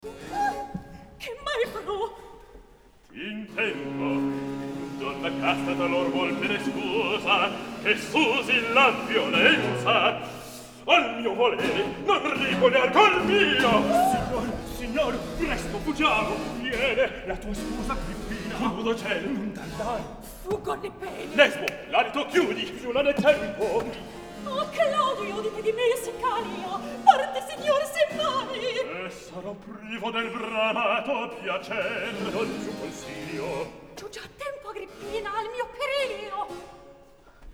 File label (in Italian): recitativo; poppea; claudio